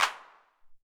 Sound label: Ugh